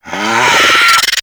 Infested Charger Run Breath; Warframe

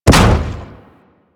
Arachnoid Camper Step Default; Warframe; Corpus

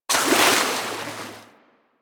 Water Sprayer Shot; Warframe

